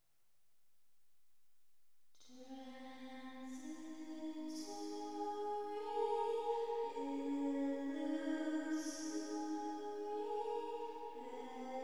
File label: Life in General vocals